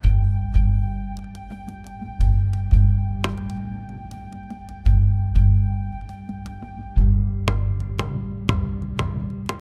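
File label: african emotional instrumental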